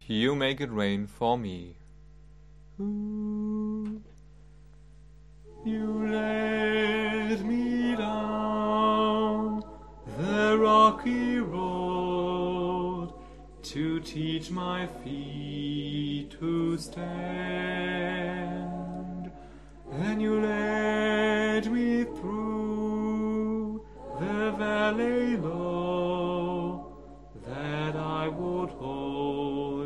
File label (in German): Sopran